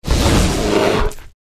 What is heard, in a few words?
Bear Dodge